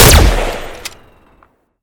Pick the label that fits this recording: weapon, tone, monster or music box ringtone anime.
weapon